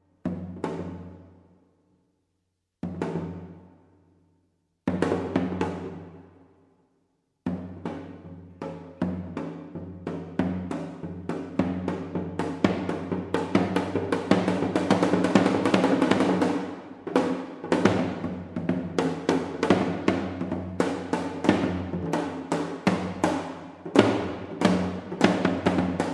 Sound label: janggu